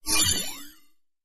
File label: level up